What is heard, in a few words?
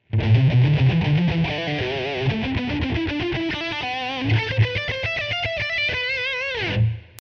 scale patterns, guitar